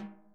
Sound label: SNARE, Interactive Drum Kits